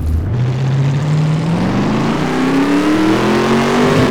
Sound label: first, detomaso pantera; lwcars